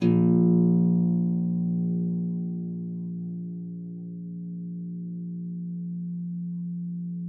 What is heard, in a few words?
A1, HARP; Xsample VOL-8; HARP P+MF